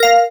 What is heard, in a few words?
Button OK